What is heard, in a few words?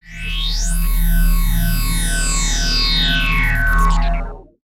Teleport Out; Worms Ultimate Mayhem